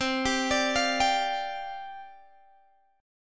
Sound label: C Suspended 2nd Suspended 4th, C, Guitar, Open G